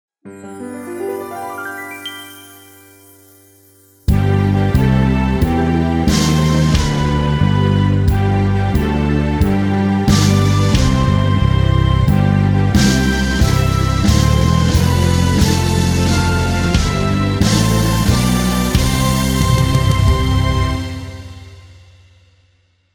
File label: happy birthday instrumental; mastermix professional